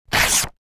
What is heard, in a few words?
paper rip